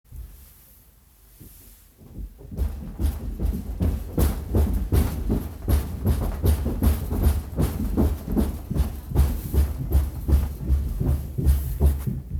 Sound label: stomping